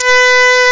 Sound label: oboe